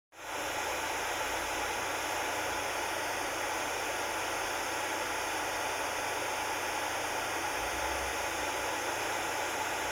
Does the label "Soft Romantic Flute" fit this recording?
No